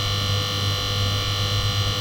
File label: fluorescent; th escape